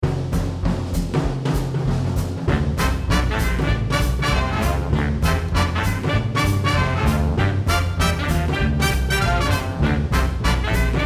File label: big band A softer